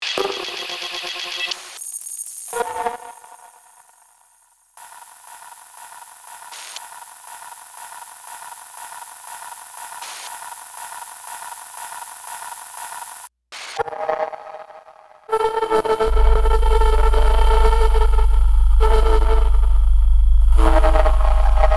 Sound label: Voice